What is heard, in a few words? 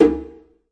djembe big hit; ETHNIC and WORLD PERCUSSION